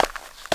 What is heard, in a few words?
concrete, footsteps; 1000fx